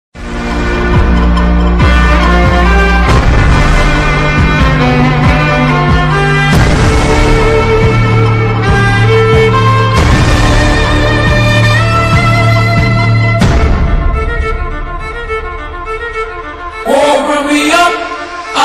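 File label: theme music background music; from kalki; hindi dubbed film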